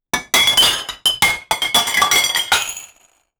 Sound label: dishes breaking